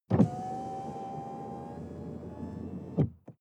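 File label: sports car interior window open distant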